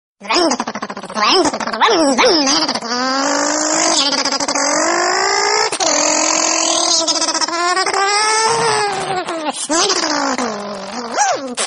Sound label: funny car